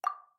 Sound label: click; Genshin Impact; 原神; 祈愿(抽卡模拟器)